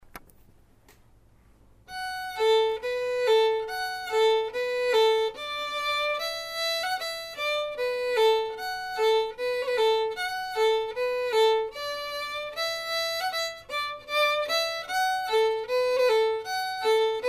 Kerry Polka, fiddle

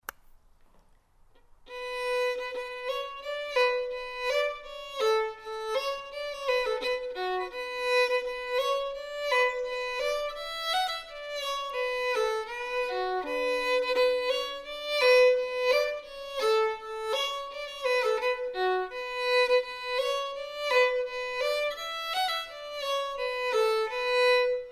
Finnish Polka, fiddle

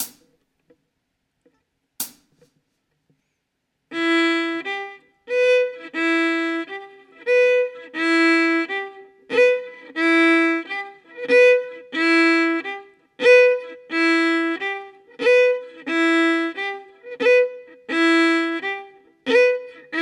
ex egb egb 30 bpm, fiddle; Exercises

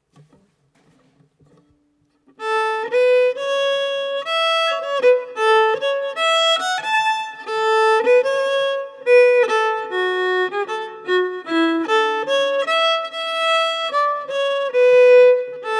auld brig solo fiddle

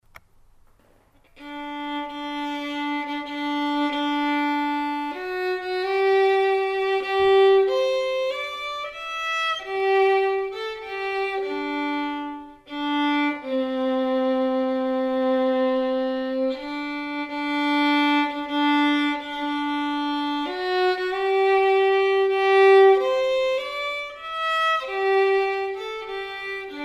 Harmony, fiddle; Gentle Maiden

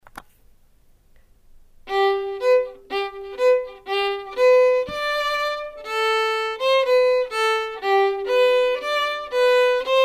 Gallop, fiddle; Winster Gallop

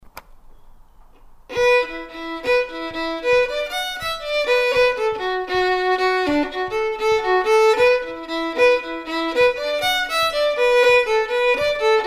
Jig, fiddle; Killavil Jig

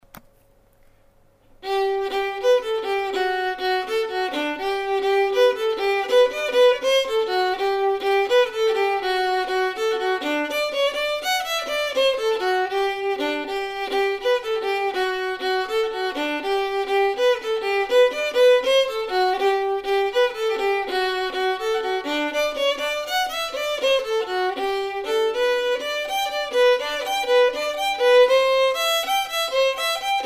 48 bar jig, fiddle; Father Kelly's

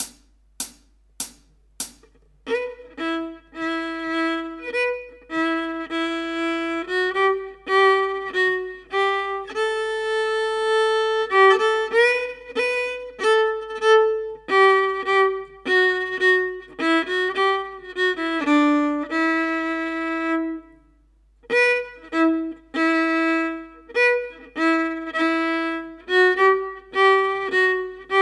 bear dance slow, solo fiddle

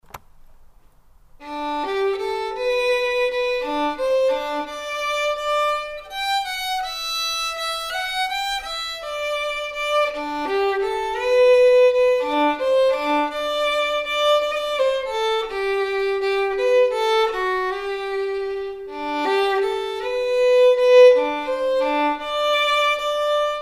Waltz, fiddle; Michael Turner's Waltz

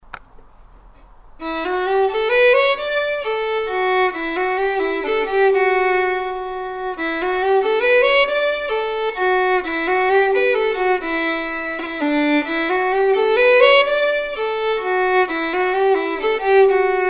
French tune, fiddle

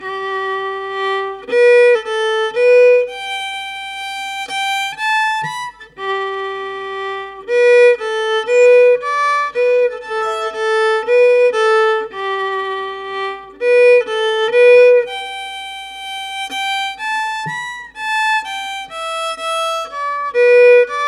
Legacy slow for Fiddle

